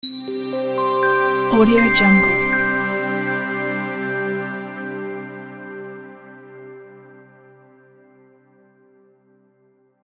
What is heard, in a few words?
corporate logo